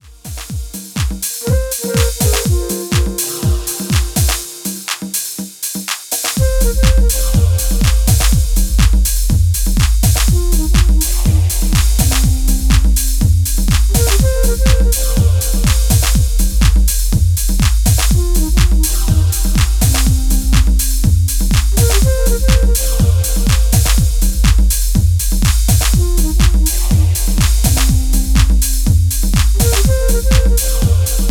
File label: Deep Flute Dub